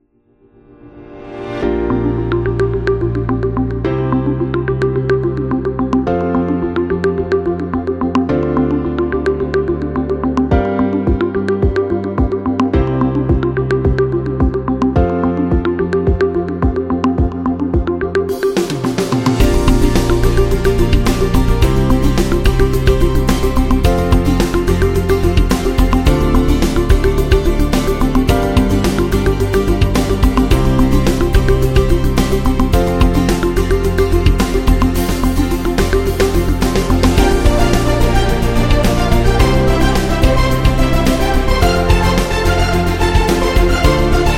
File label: Success Corporate